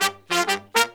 Horns, 96BPM; 90 s Sample CDs; Zero-G; Phantom Horns

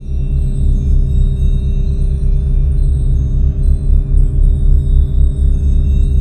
drone, Pipe Clusters; E-MU Producer Series Vol. 5 – 3-D Audio Collection; 3DSFX

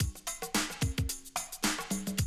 110, Classic Drum Loops

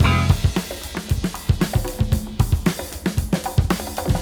breaks, breakcore

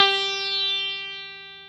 EL SITAR G3, Elec. Sitar; Jones Elec.Sitar

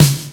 SNARE, WET SNARE; AKAI S6000 CD-ROM - Volume 3

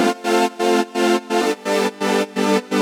Pad DS201 170 A, sidechained

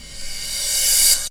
WHOOSHES; Megabass Remix! 2

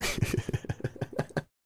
LAUGH; Spectrasonics Vocal Planet; Jazz FX

